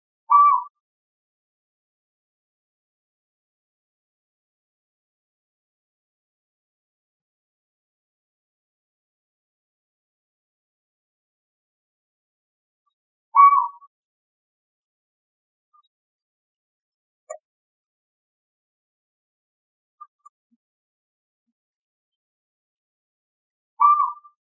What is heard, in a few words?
Asian barred owlet Glaucidium cuculoides, Kaziranga